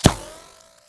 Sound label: dirt, hit; tacz-tweak-pack; taczmechanics